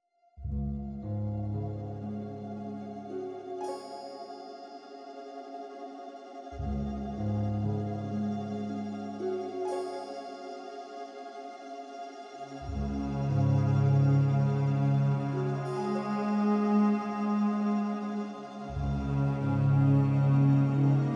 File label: Con sordino cellos